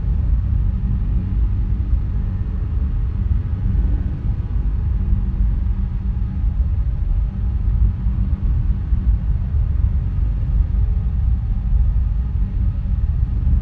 cruiser hum, ambient; Stellaris

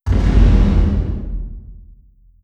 distance planet killer fire; apocalypse

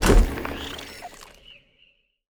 vivarium open; Stellaris; grand archive

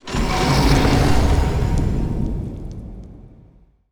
lithoid moving out science; Stellaris; lithoids

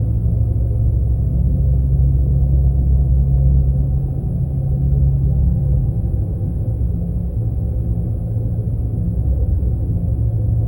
ambient transport ship hum; Stellaris

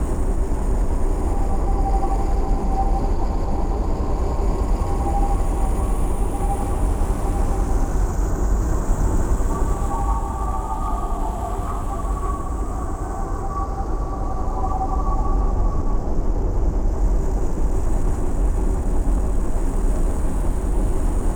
nemesis colossus idle distortion; Stellaris

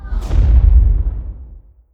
bombardment explosion; weapons; Stellaris

